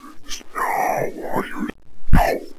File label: pyro alert